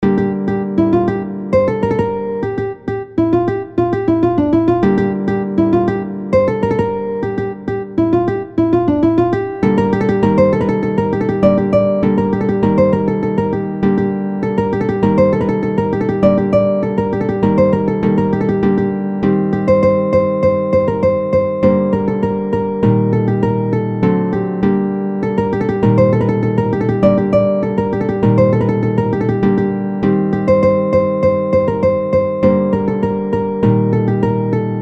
Guitar

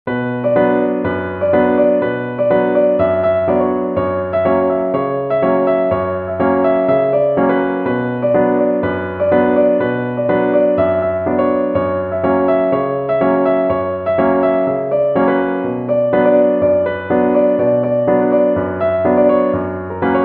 piano

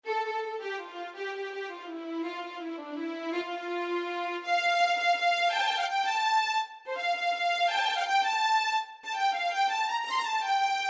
Violin

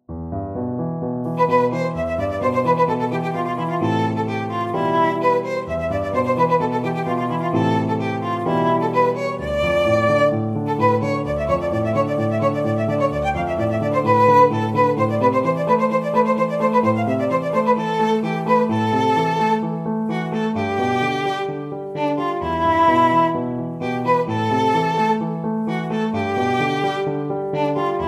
violon

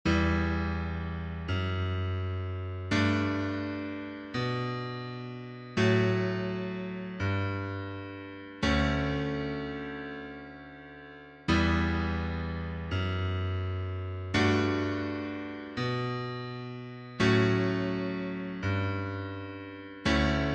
Calm; Minecraft

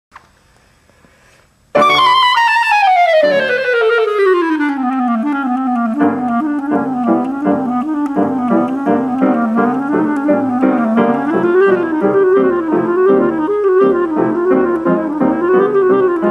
Bb clarinet; Flight of the Bumblebee

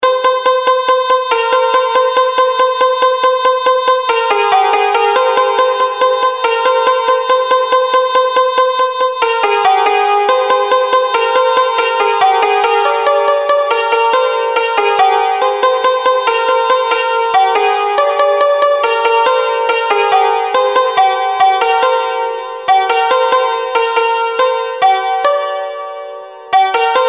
santoor

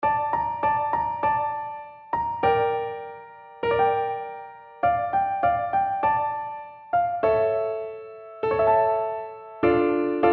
Lonely Bar Piano

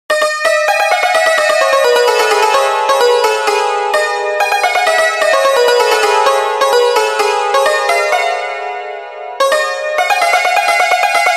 santoor

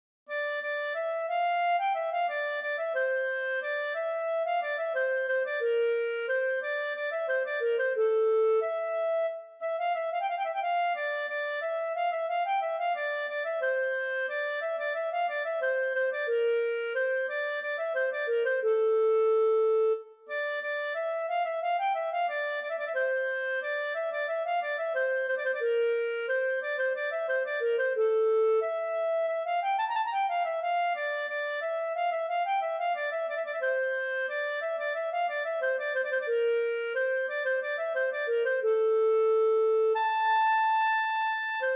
Clarinet

